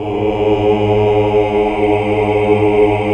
HAL AAH. AAAH Uni

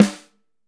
5X14, Drums

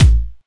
Heavy Kik, Kick Drums; Zero-G; Soundclash

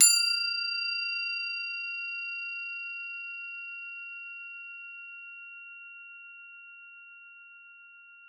CRO F4, CROTALES; Xsample VOL-4

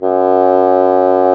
BASSOO, Bassoon; Brass&Woods

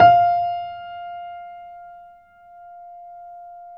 MF F5, GRAND PNO

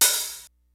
G-FUNK KIT, DRUMS; Kid Nepro; Elite

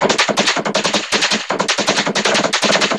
Vocobeat; Sonic Foundry (Sony Creative Software)

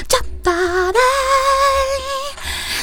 FEMALE, Hip Hop; E-MU Formula 4000 Series Vol. 1; Hip Hop Nation; Phatt Phemmes 3

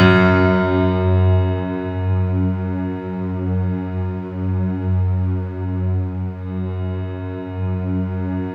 F#2, Synth Line; 90 s Sample CDs; NiceBeats; SynthLine VOL-22

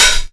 HH OPEN

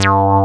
JP6 mouth; E-MU Formula 4000 Series Vol. 3 – Analog Odyssey; VK+ Instruments

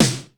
DRY S, drum; Crypton - DrumBase5000; DRY KITS

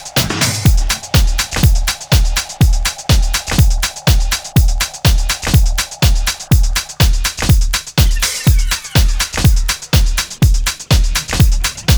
house loop

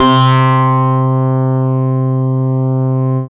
single-synth, techno, c; Techno ID

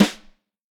Vintage Snare Drum, 5.5X14; Q Up Arts; Johnny.C's Vintage Snare Drum

